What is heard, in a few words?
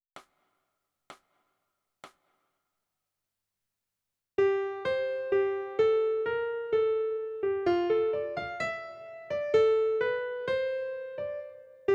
Melody